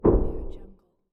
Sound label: scifi spaceship interior background impact small